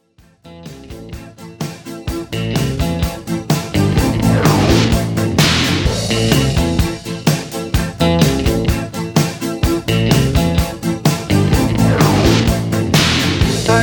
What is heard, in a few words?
Re Drum 127bpm